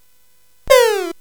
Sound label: Dark Castle, CSG8580R5